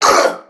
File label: Pain Crewmen; Warframe; CorpusCrewmen; CrewmanB